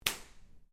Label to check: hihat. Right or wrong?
Wrong